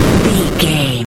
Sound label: retro explosion general